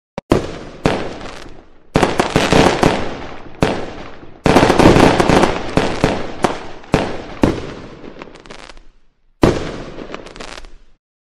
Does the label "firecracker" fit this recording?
Yes